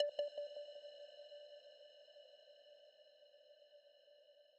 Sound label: arp